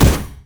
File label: displacement flash; LoL; leblanc